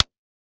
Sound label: Impact Body Slap; Impacts